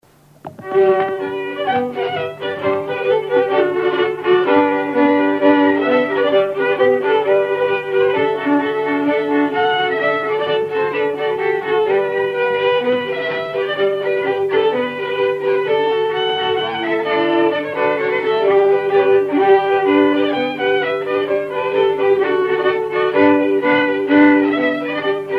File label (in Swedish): Paradmarsch Norsk marsch; Södermanlands Reg.; 1952